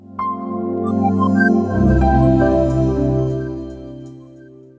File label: Phone Power off; Samsung SGH-i320; Power Sounds